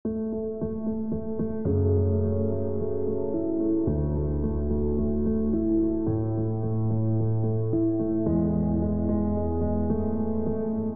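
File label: piano with all effects; Sweetwater Creating Great Sounds; creating great sounds in your daw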